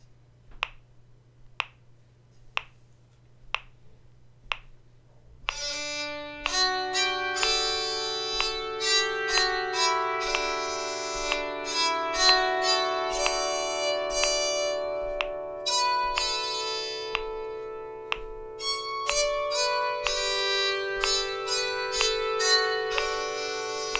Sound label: Barbara Allen, bowed psaltery